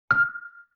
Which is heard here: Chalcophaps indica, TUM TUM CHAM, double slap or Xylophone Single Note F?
Xylophone Single Note F